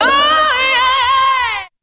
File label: "oh yeah" SUNG